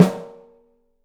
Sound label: OPEN STEEL SN; 3D Drums